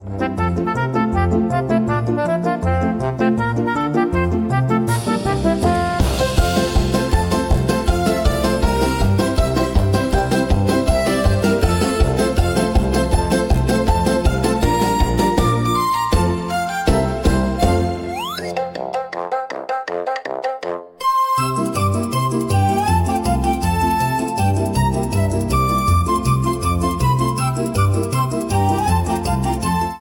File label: catch up